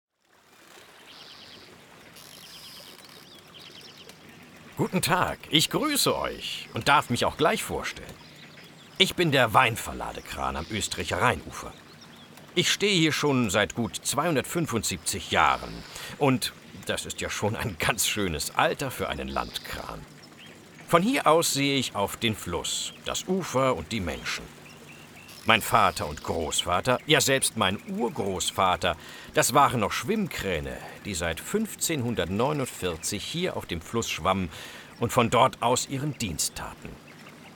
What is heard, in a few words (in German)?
Weinkran Soundeffects, Oestricher Weinverladekran; Oestrich-Winkel; Rheinkran